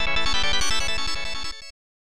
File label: All Clear; Super Puyo Puyo 2 Remix